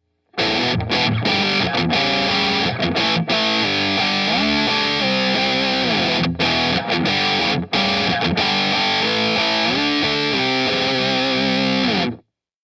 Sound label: High Gain Green